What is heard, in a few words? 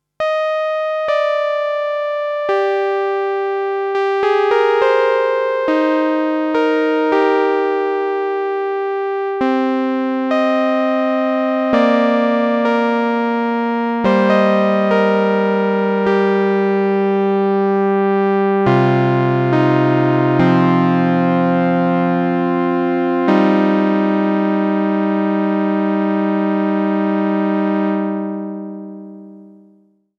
Boss CE-300 SEQ Juno Dry